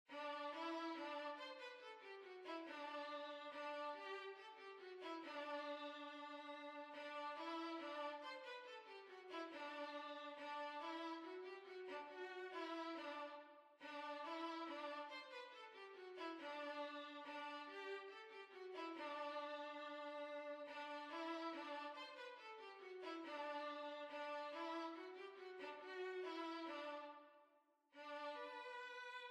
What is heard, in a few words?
Hornpipe, Violin